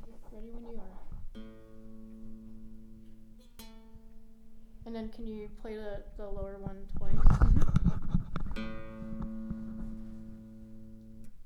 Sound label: guitar